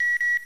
radar expired

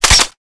bolt pull; weapons; transformgunadv

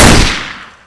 famas; cstrike; ZP CRISTIAN; ZP WEAPONS

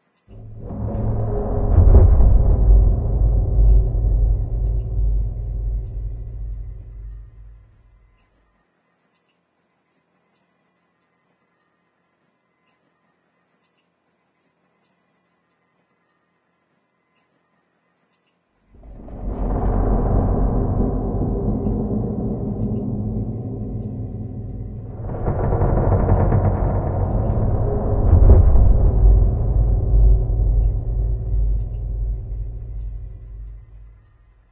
surface metals

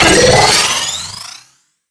beep, weapons; transformgunadv

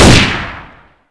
sound m4a1; ZP CRISTIAN; ZP WEAPONS

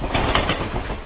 train; ze train; cstrike

